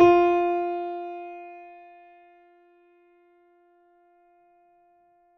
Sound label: mini grand; bananenkeyboard